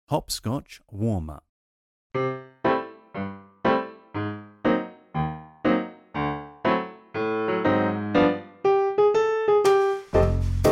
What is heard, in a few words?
Hop Scotch warm up, tap